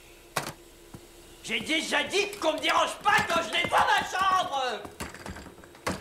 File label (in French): aspirateur; doofy nettoie sa chambre